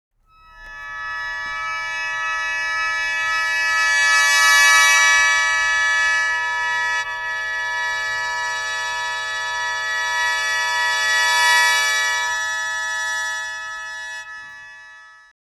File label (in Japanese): sho